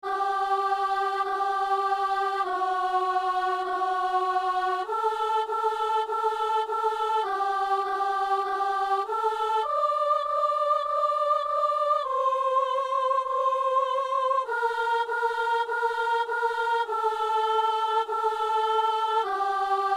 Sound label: soprano; Messe de San Lorenzo - Agnus